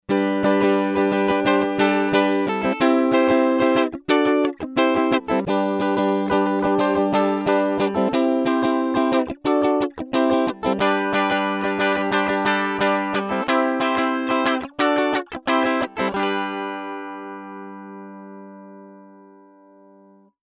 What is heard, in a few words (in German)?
E Uke DI Hals Beide Bridge